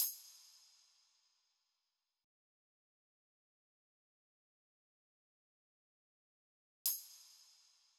Drum Loop 140 BPM Hit; Loop Stems; Cymatics; Mystery Pack Vol 4; Birmingham

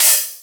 Open Hi Hat, Dubstep Drum Shots

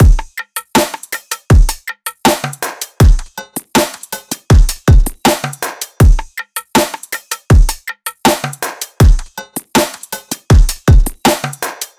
Drum Loop - 160 BPM Kick, future bass; Drum Loops; Cymatics; GHOST (Beta Pack); Acorn

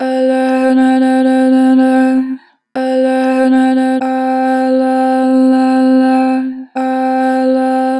Adlib, Harmor Vocals; 4 bar flat; Pitch Flat

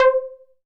Moog Model D PLUCK Alloy High - C; Synth; One Shots; Cymatics; Fantasy Synth Sample Pack

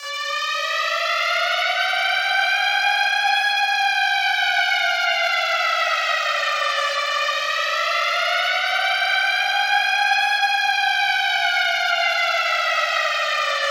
Siren - 140 BPM D; FX

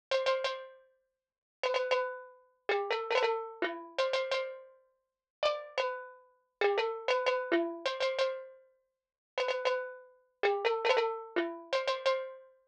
124 BPM A Min Pan; Melodies; Loop Stems; Cymatics; GHOST (Beta Pack); Arsenic

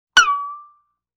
Violin Pluck Slide

